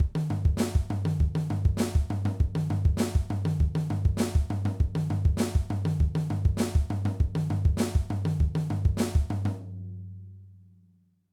Impressive Beat

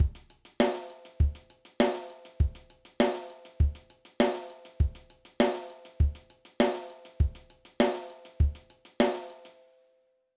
Moeller Exercise; Moeller Technique; drums

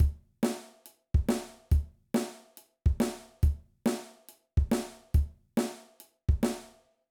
Punk Beat

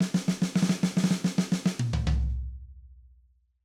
Fill, drum

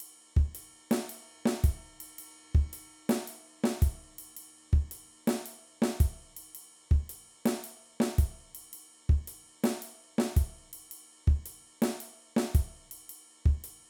Snare and Bass Comping

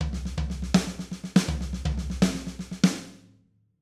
Triplet Fill, drum

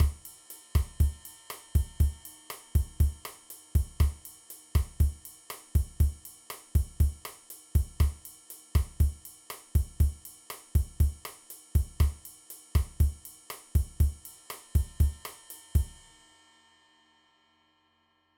Bossa Nova Beat, drum

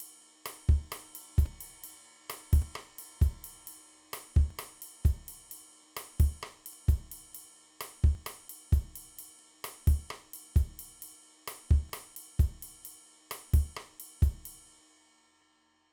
Salsa Beat

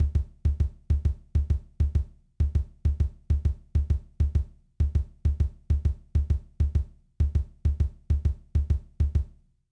Foot Exercise, drum

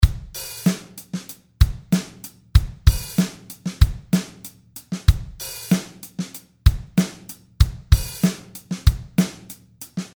Hip Hop Drum Beat

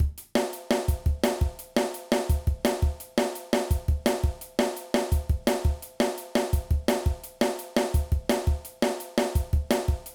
Groove Drum and Bass Drum Patterns

